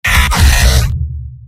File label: pain sharp; heavy; source engine; tf; mvm